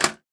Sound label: shelter power on; Original TK Sounds